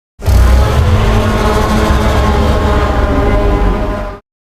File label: kraken roar